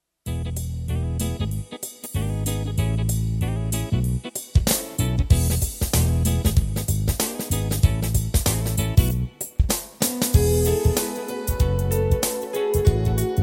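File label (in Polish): instrumentalna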